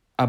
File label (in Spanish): Aplaudir (Clap)